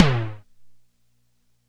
JUNO TOM, Elec. Percussion

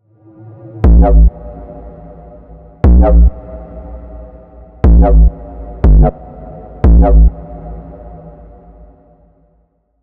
A good old womp, Neuro Bass; GranularSumo Neuro Bass Pack 01

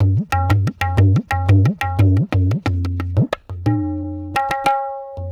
Tabla

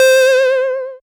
C Synth, One Shots

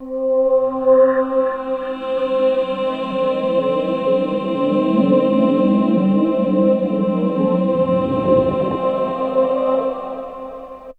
Digi Pad C, Ambient; DigiPad 1; Trance Formation

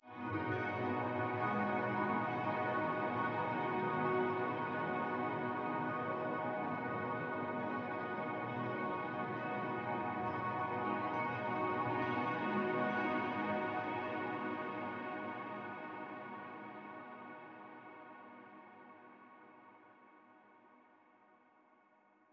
a g sharp minor, pad; M8

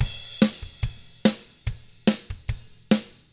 ROCK, Drums; ILIO; Double Platinum Drums 1

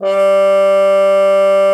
CUP MUTE; Xsample VOL-3

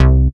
Bass C, Offbeat Bass; Vengeance - Essential Clubsounds Vol.3

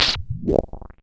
Scratchy & Squeaky Clap, drum machine; 300 Drum Machines; DevSnd

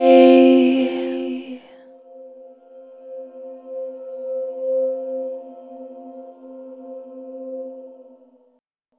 Chord F-C 5th; Sonic Foundry (Sony Creative Software); Pocket Diva World Class Vocal Samples

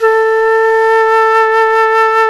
Jazz Flute

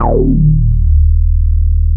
D#2, Bass; E-MU Producer Series Vol. 6 – Analogia Project (CD 1); Boom da Bass 2

